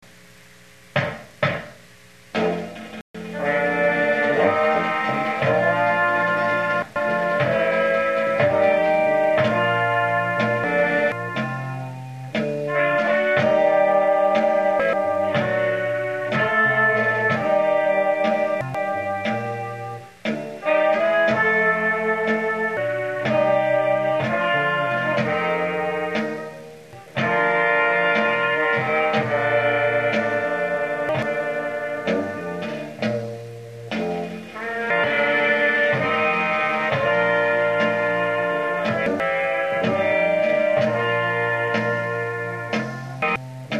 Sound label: brass band, Old Rugged Cross